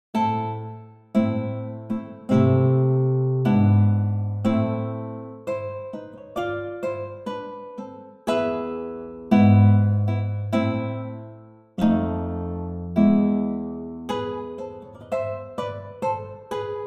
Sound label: Nylon